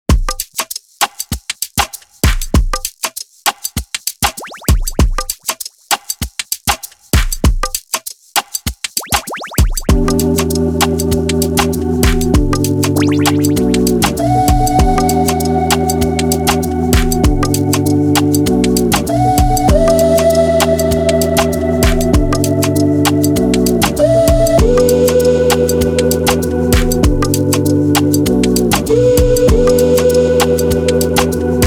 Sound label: Signal, Ethnic